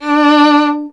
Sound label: STR VLN, Vln Detache; Miroslav Vitous